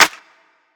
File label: Clap